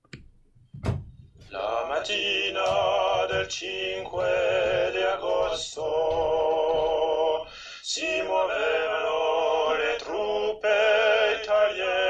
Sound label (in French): alto